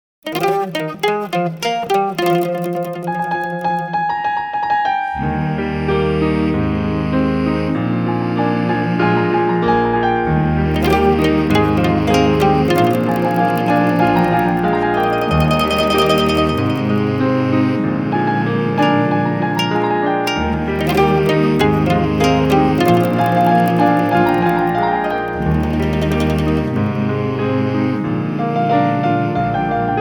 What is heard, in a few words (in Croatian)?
Akuzaj instrumental